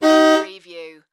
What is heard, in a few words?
Auto Horn Honk; sound effects bible